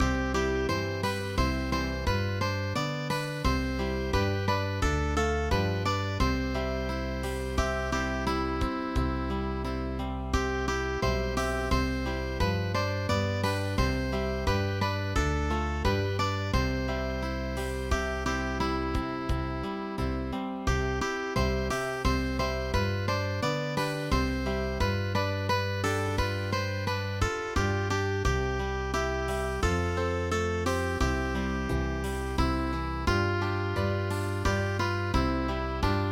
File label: Mandolin Club